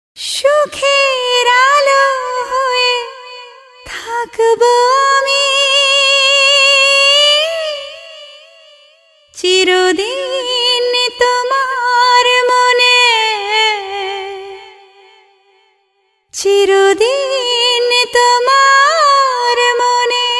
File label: Female; Aparadhi 2009